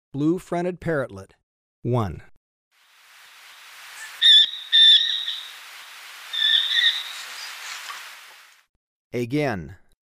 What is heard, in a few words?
Blue-fronted Parrotlet; S. America